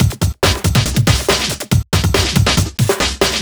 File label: LoFi Beats, 140 BPM